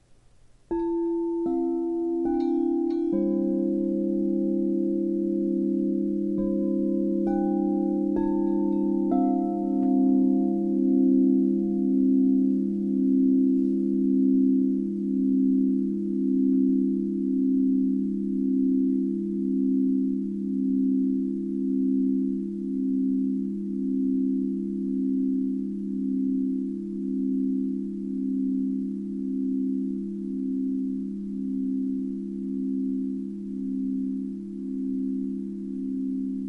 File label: Chime